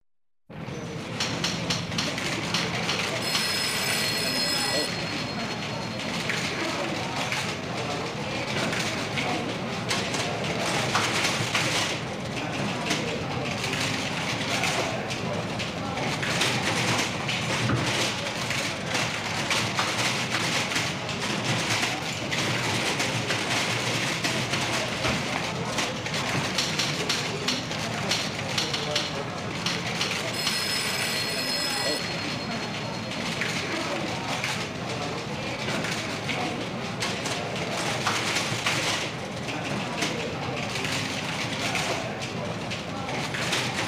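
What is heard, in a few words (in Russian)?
звук оживленного офиса печатные машинки телефонные звонки голоса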